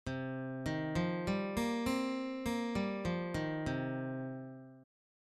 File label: c minor pentatonic; C Minor Pentatonic Scale